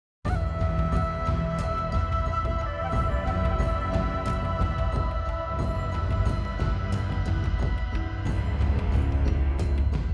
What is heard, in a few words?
Elevator Fight; weeping Ridge